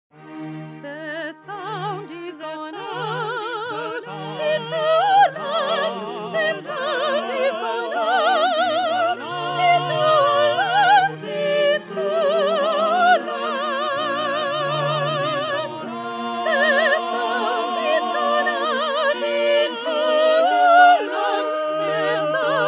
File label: Soprano; Handel; Messiah